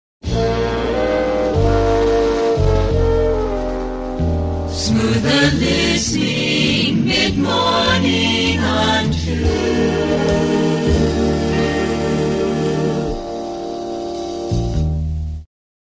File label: jingle